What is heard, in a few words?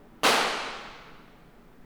Impulse Response